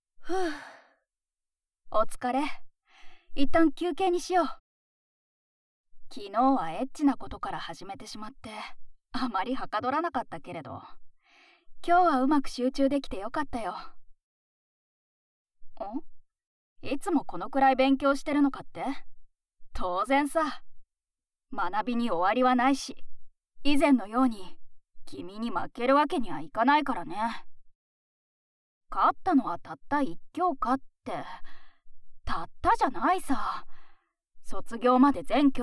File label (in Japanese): 耳を愛でてあげよう